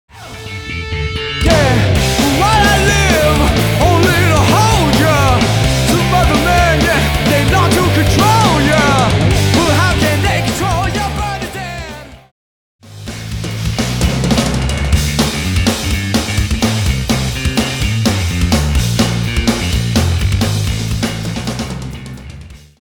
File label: Backing Track